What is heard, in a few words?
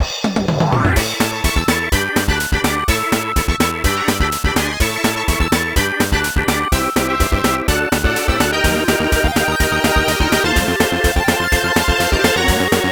unknown song; SonicAdvance